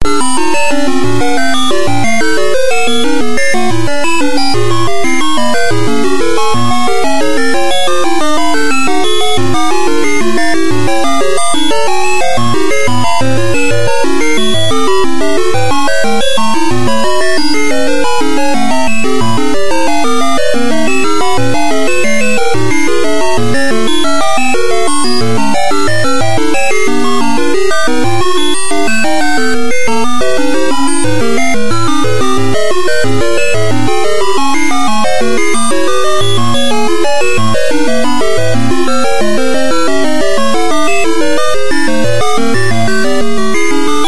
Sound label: music; crazy bus